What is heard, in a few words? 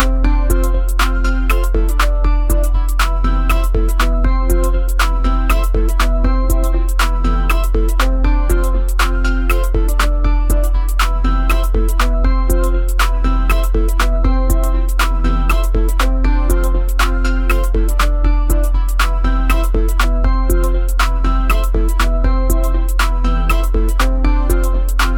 i need a beat, podcast